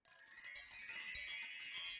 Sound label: Chimes B Finger up, Latin Percussion; Drum Hits; Bell; Ableton; Factory Packs